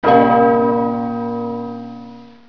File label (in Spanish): campana